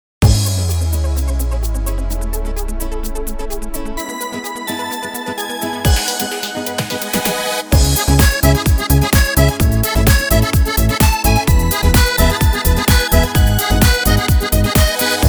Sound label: Disco